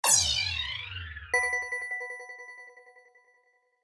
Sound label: Electricity email alert; Nokia Ringtones; Audio themes